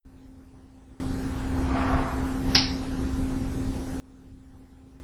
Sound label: chair moving and finger snapping sound